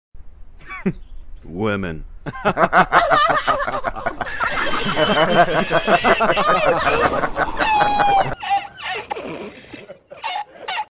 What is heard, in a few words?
Women Laugh Track